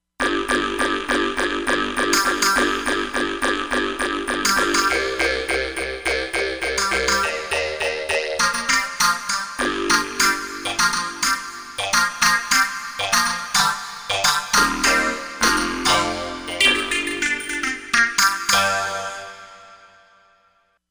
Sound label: Reso Snap, Korg MSC-07S Synth; Korg MSC-07S Synth 2